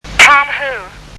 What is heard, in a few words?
tom